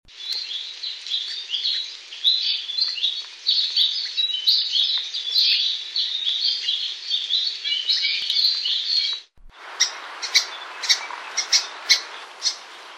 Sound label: Alauahio